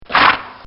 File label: Horse Snorts; Horses (Sound Effects:Animals)